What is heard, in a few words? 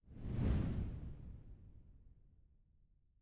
Switch Room; Legend of Keepers (gamerip) (2019)